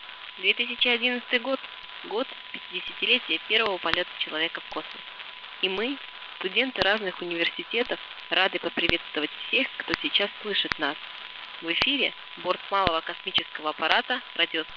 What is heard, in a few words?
arissat russia